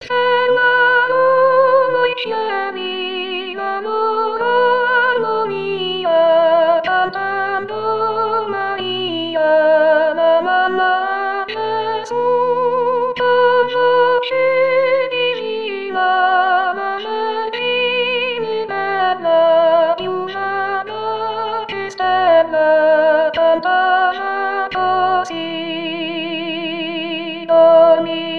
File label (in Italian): Soprani; Fermarono i Cieli; S Alfonso; natale